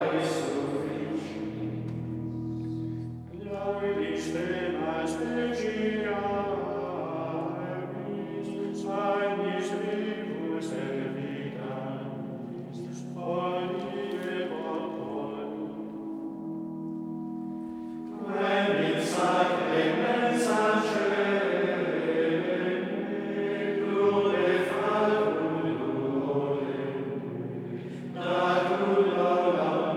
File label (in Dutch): Koor mannen Gregoriaans; Lauda Sion